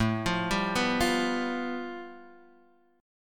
A 7th Suspended 2nd Sharp 5th A Guitar Open D, chord